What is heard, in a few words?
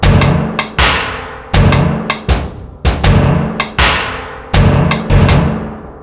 Beatin Metal (80); Beats